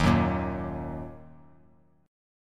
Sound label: Eb Minor Eb; Ebm strummed; JGuitar